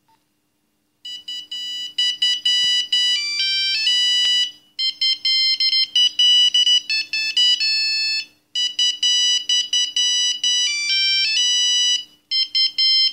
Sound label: nokia 3110 ringtone jingle bells